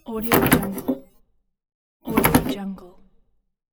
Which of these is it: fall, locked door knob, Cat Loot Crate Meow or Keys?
locked door knob